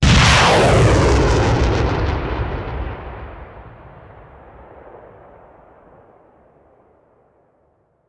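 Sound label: Artillery Missile Launcher; Sound Ideas; Guns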